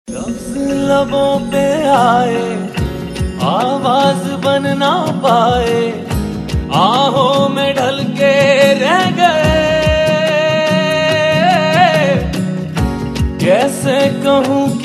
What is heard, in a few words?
Male